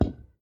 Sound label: lift, firework